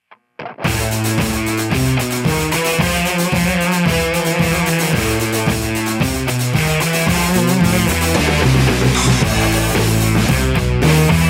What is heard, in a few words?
fuzzy psychedelic, pedals